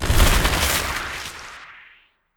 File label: Spell Enchant Brambles